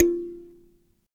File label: Dyna Sansa, Africa; Roland LCDP11 Africa VOL-1; Sansas